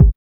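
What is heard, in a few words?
HSE-BD, house bass drum; X-Static Goldmine 1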